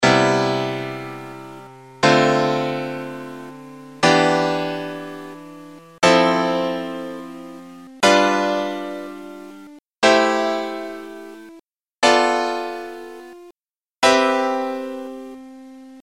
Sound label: major chord scale